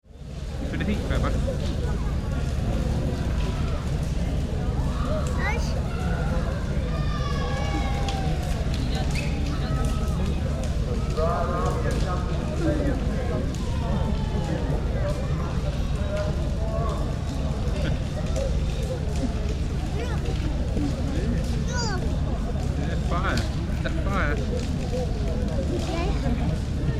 Crowd at Bonfire - Fire roar and crackle with background crowd; some close voices